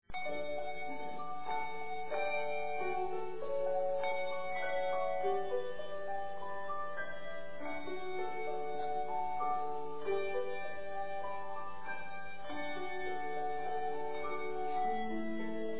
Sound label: Bell Choir